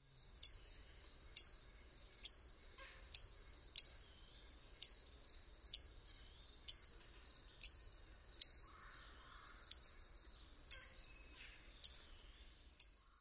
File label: alarm calls; KY